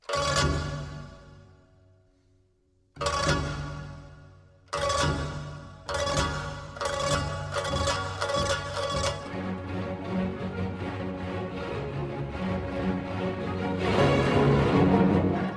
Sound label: battle racetrack